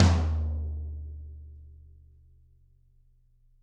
Toms, Drums; Bob Clearmountain Drums I

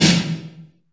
LARGE ROOM 808, REVRB

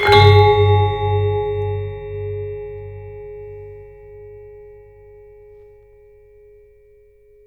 ORCH HIT, GAMELAN HITS; Spectrasonics - Heart of Asia CD2

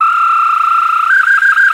Ocarina Bird; Planet Earth; Digital Sound Factory Planet Earth

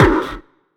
Distortion Kicks, Bassdrums

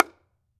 H-BONGO MH; Cuba

